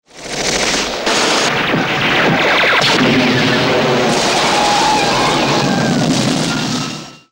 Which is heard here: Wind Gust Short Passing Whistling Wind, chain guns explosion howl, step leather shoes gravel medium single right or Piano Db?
chain guns explosion howl